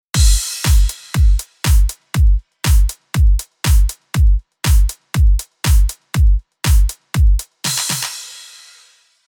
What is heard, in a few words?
Artistic EQ Beat API 2520 Color Off 60Hz 3dB Bell 8kHz 2db Shelf; Fredenstein